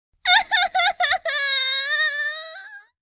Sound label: anime cry